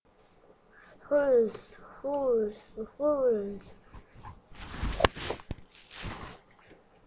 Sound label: horse